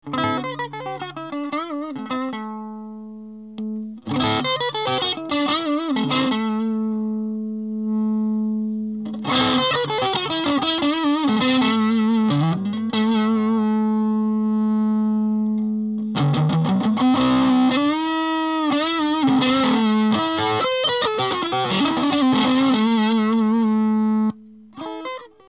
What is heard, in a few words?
Dirty drive